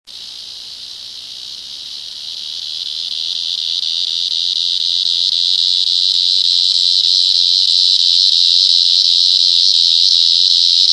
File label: cicada; T resonans